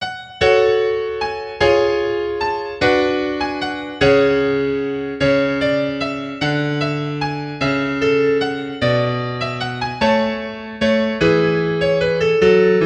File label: Courante Nouelle; Michelagnolo Galilei; Johannes Aegidius Berner de Rettenwert in Lampotin; Praha IV G 18